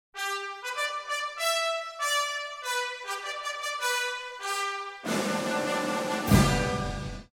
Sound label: start action; music kits custom